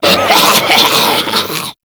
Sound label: Infested Runner Attack Call; Warframe; Runners